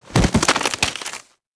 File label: deadfall grass